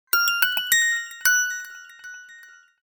Tinkerbell, notifications

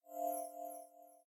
sparkling bubbles tap; Samsung; Galaxy Note 5; ui

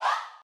mms Sent

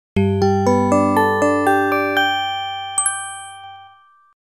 Bell; SKY IM-S500K; System Sounds

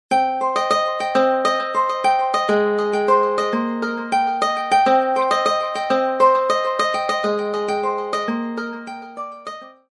Guitaro, ringtones; Magic

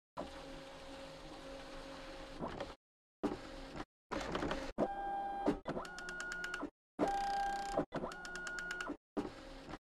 manner office, ringtones; Samsung; Galaxy Tab SHW-M180S

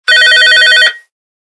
Office Phone; Sony Ericsson P990i

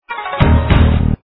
Game Over; INOI 108R; Ninja Up

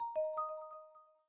USB Connect; Meitu; V4

